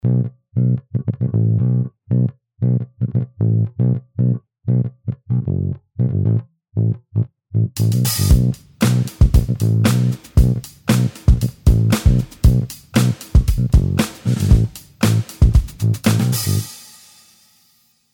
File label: real sub bass original bass eq; mixing